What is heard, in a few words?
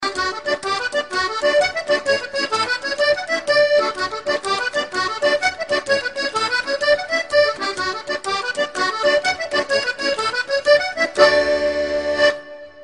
Bagpipe